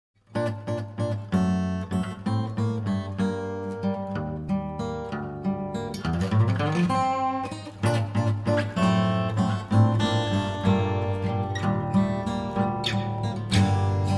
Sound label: MENUET